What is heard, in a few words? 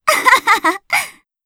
comboburst